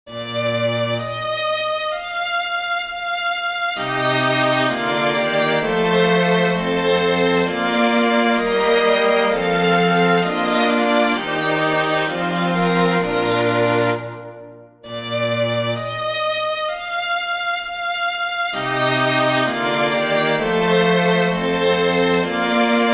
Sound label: choral for string quartet; bach johann sebastian; stille stille ist die losung